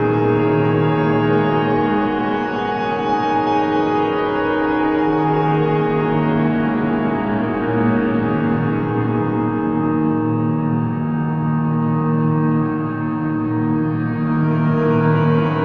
Piano Blur

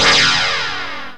BLAST; East West; Global Sounds

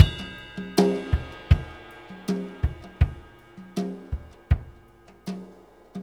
Tom Groove, Laidback; Sonic Foundry (Sony Creative Software); Drum Tools

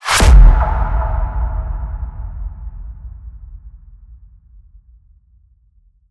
impact echo; Virtual Riot; Impacts

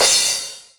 909 Crash, Novation Drumstation; Novation DrumstationV1

